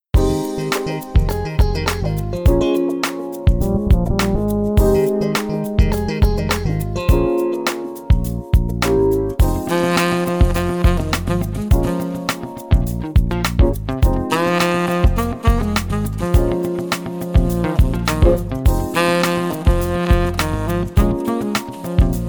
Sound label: Blue Sax